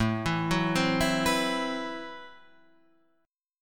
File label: A Minor 6th Add 9th A, Chord; Am6add9 Chord {5 7 4 5 5 7} arpeggiated